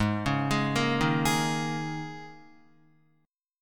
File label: Ab Suspended 2nd Suspended 4th Ab down Guitar; arpeggiated; JGuitar